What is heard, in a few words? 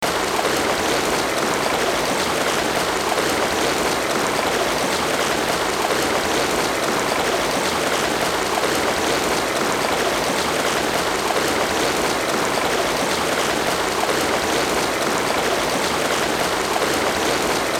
Waterfall Ambience; Over The Edge; The Legend of Mata Nui; Game Music; Console Games